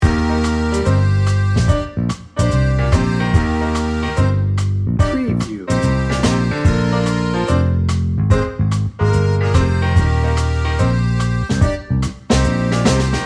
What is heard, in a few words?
loop